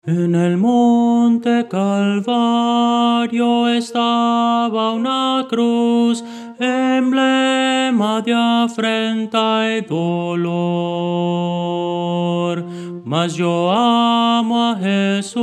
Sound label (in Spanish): tenor; Voces para coro